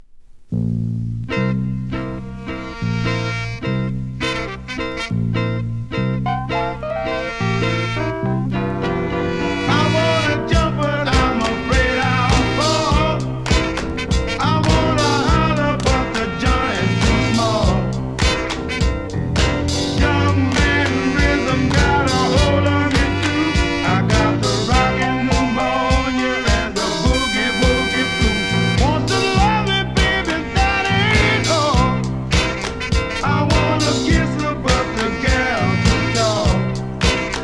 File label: PIANO